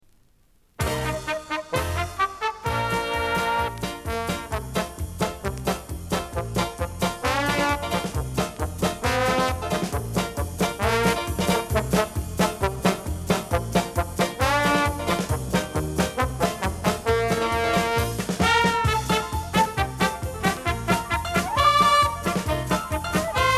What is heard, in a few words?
polka